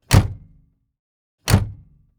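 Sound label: Massey Foley Door Closing Interior, Massey-Ferguson 3060E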